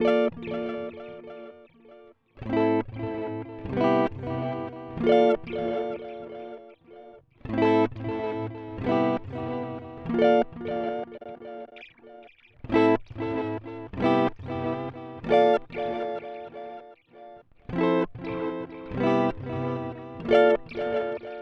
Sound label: Starlight Echo Station Tape C